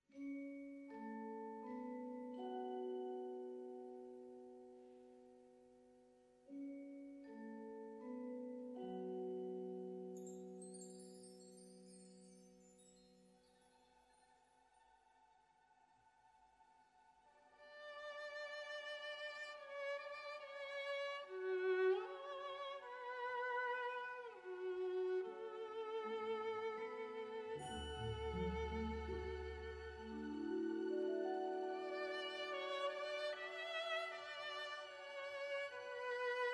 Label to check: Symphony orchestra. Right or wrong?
Right